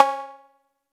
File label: 808 C bell modulated, Drums One Shots Percussion; Groove Elements